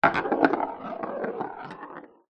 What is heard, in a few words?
sport snooker ball roll across wooden floor